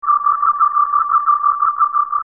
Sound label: tricorder